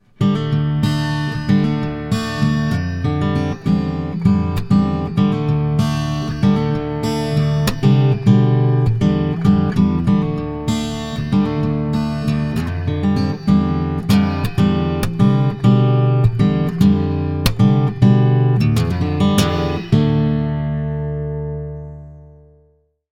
Yamaha Transacoustic LS-TA Fingers Reverb, guitarra acustica